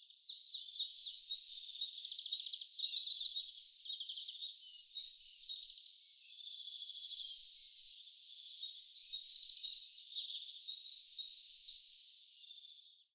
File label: Cyanistes caeruleus; SETE